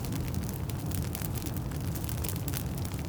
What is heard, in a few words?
fire small; emitters global